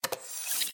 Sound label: bonus disabled; rise of maya